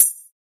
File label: metal